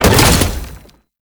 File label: Wall Climb Dodge End Default; Warframe; Oraxia